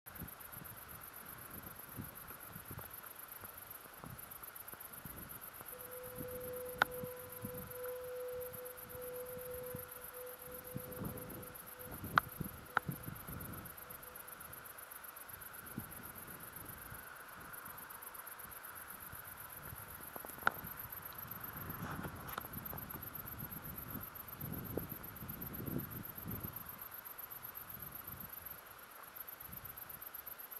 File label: Lighthouse; فانوس دریایی